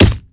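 tverdij uverennij stuk molotkom, firm confident hammer knock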